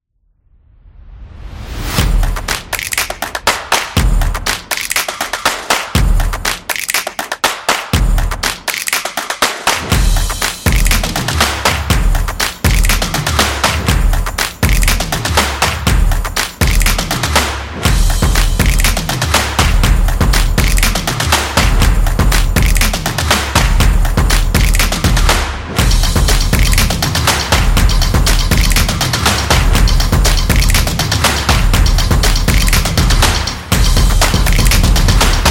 Clap Intro

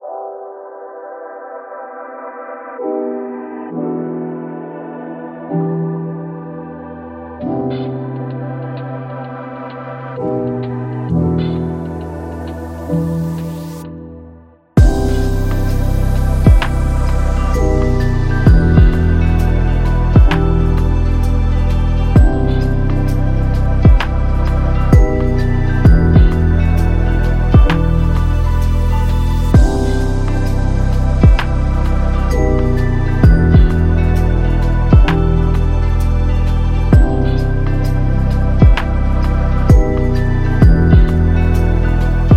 Lofi Hip Hop

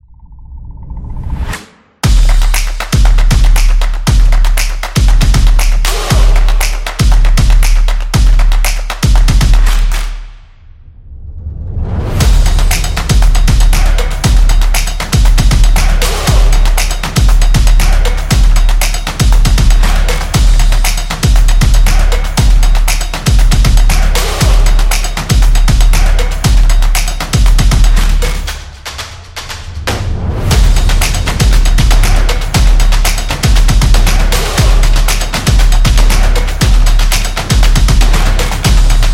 This Is Drums

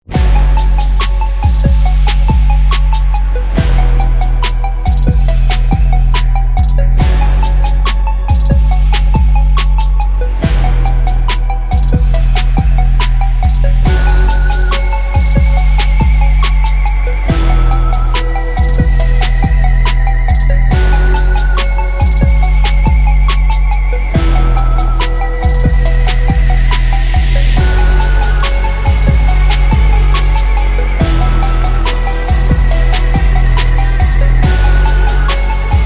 Trap Suspense; epic sport

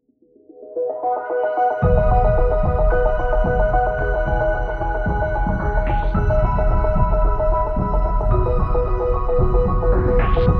Luxury Ambient Cinematic, corporate music; corporate music vol 20

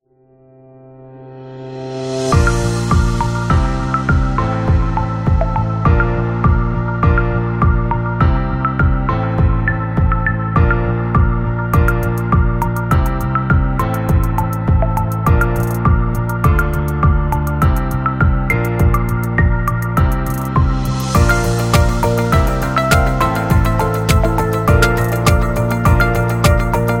Corporate Ambient

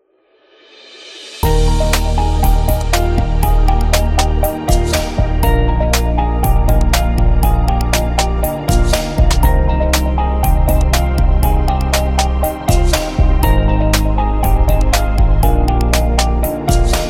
Corporate Interview

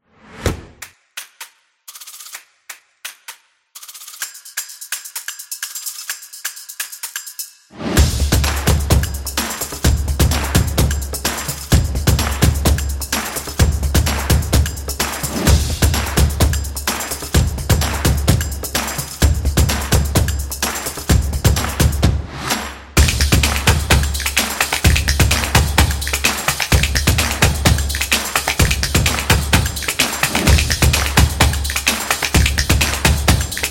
A Percussion Dynamic; energetic drumming

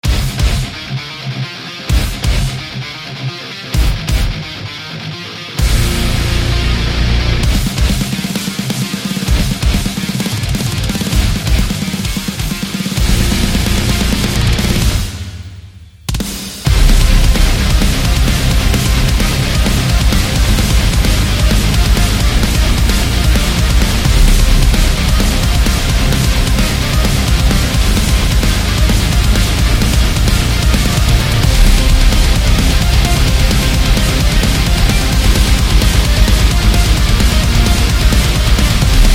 Metal

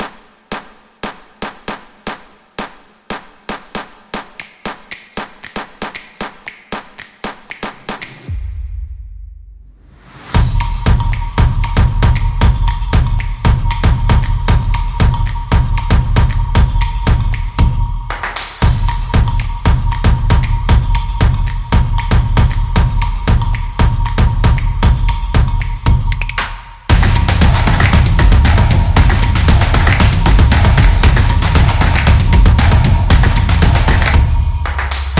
Clap Drums

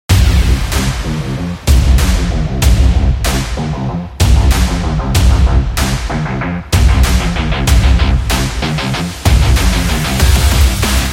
Powerful Sport; epic sport metal

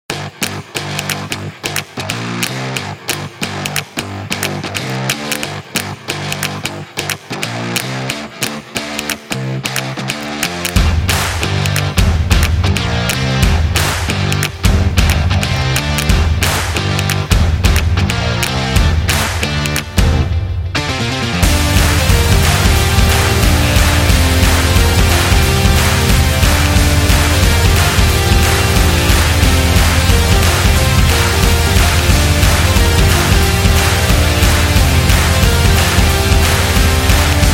Happy Energetic Indie Rock

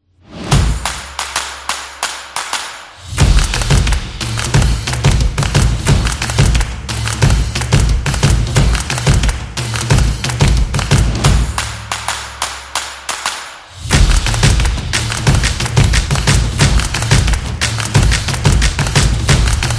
Percussive; drums trailer

